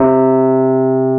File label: AMB ROAD, Keyboards Old School; USB Soundscan vol.09; AKAI